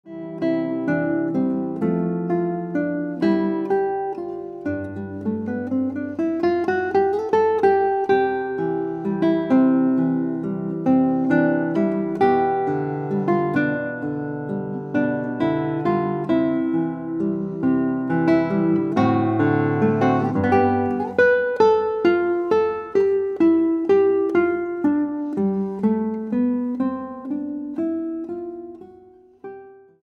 Guitar

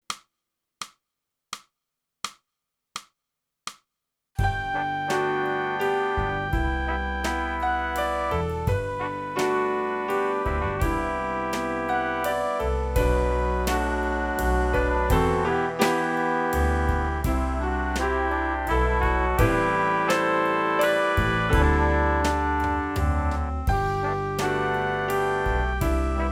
sax, a sad tale

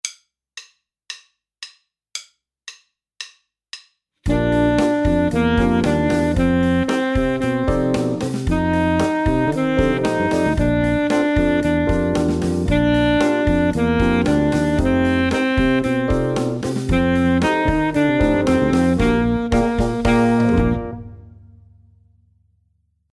Full Sax

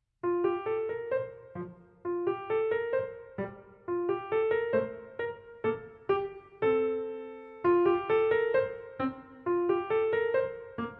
piano

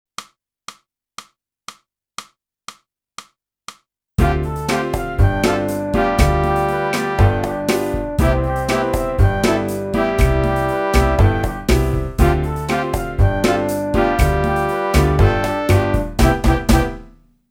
clarinet; boosey method

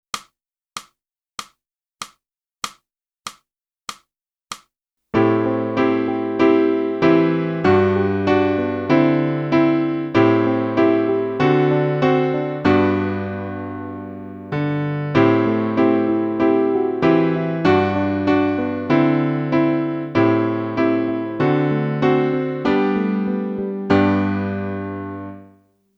flute; boosey

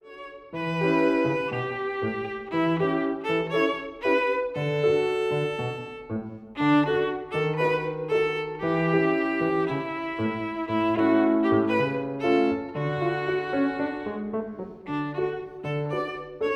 VIOLIN

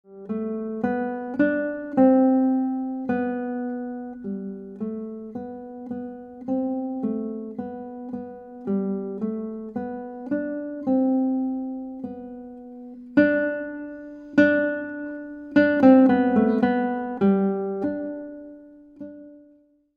Guitar

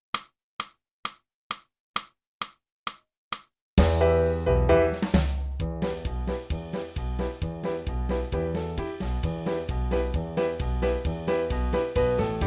flute jewish wedding song

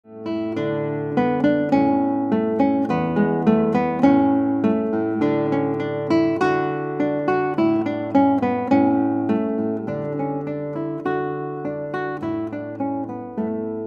Guitar

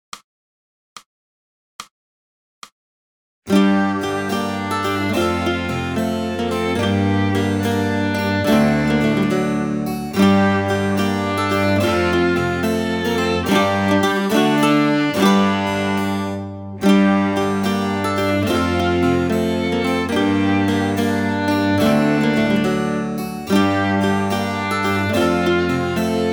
backing track

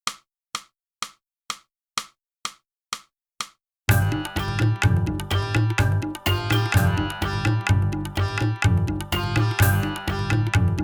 flute, tiger leap

